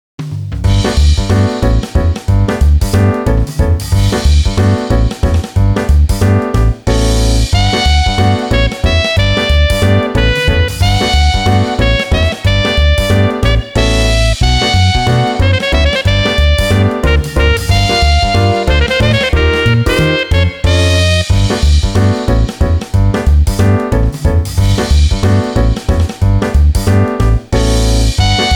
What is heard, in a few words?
Shops with many orders, BGM